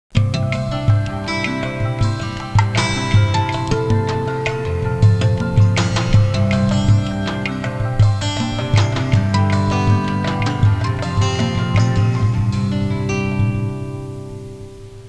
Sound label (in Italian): suonerie musica hawaiian